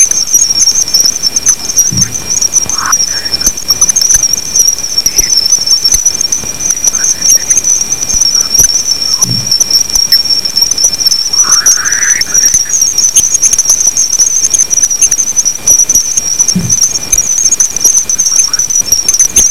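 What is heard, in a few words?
radio sound collision of winds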